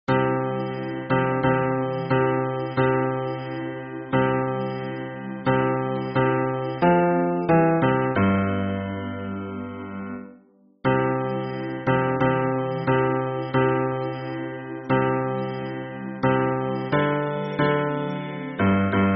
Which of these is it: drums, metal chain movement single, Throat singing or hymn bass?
hymn bass